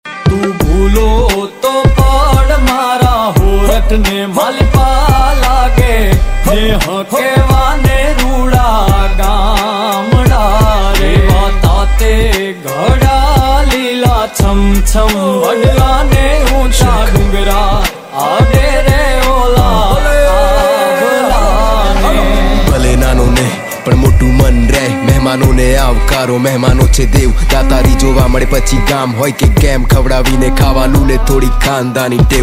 gujarati ringtone

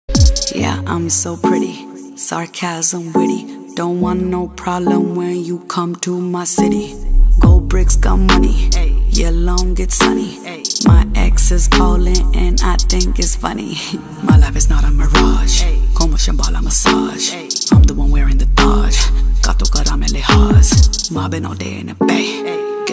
punjabi ringtone

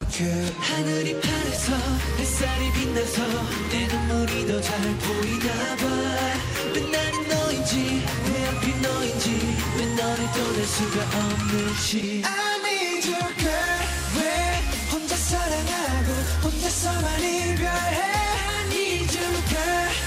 korean ringtone

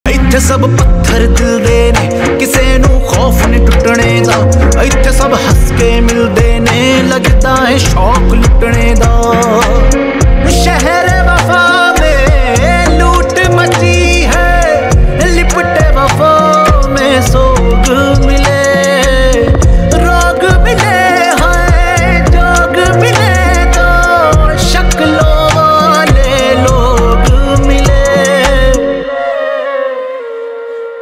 pakistani ringtone

